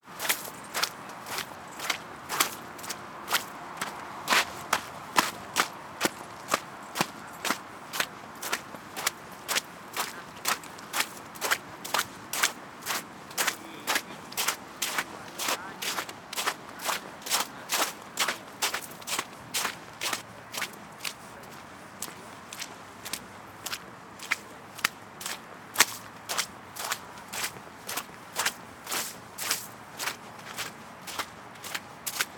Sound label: Thaw Walk